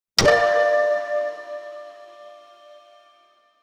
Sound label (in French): fais un son de jeux, video games